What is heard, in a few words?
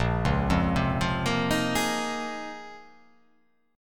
Bb Minor 11th, Bbm11 chord; arpeggiated; JGuitar